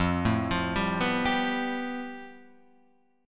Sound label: A 7th Suspended 2nd Sharp 5th F, chord